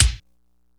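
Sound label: BOMB, cyberpunk; Rubber Chicken Software Sample Bank; CYPERPUNK 2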